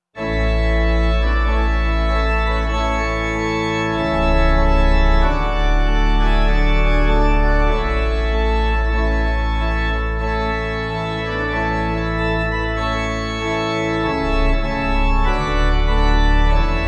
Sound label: Mine Eyes Have Seen, Pipe